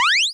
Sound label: jump, Retro Sounds 32-Bit